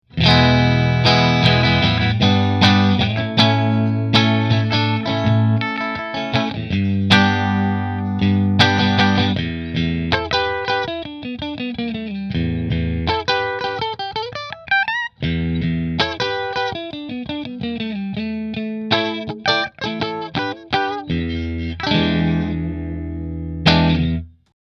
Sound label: Rahan Position 5 Fender, guitars; Rahan Guitars RP Double Cutaway Green Flame Maple Top